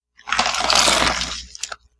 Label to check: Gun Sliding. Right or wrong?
Right